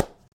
Smack; Lewd SFX

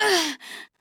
Grunt; Lewd SFX

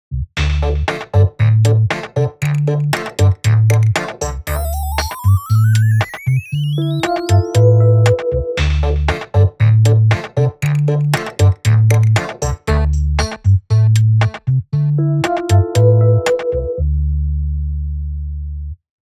music

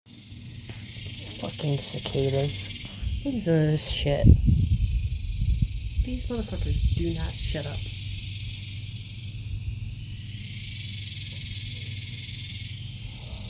Cicadas

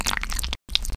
slosh; Lewd SFX

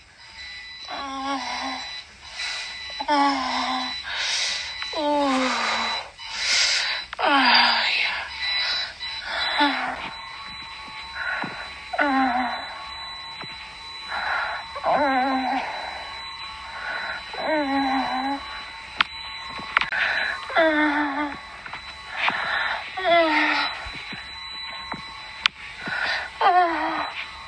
Orgasms, Short, Loud, Moaning, Yelling Oh Gosh; [F4M] Orgasms Are Like Fine Wine; [Wow]

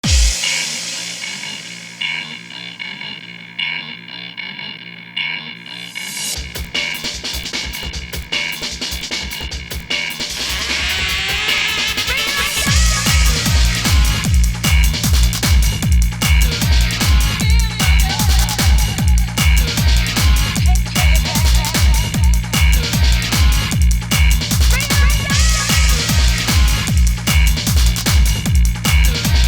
Violent Rockin'; The Showoffs Present Criminal Sound - The Music of Canterlot Siege 4

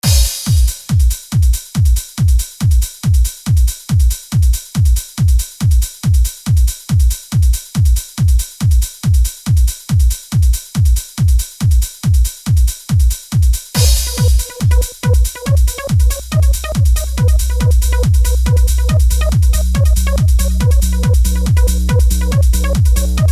Rainbow Trance

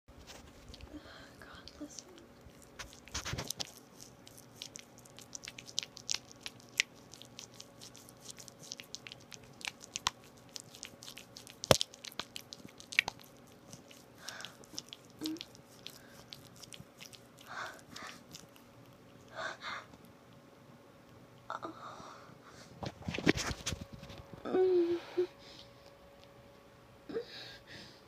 Dripping; Bluevixenx

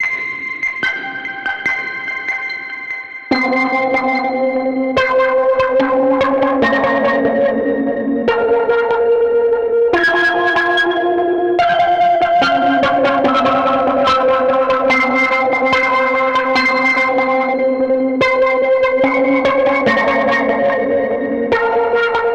distorted, 145BPM

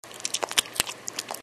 Suck; Lewd SFX

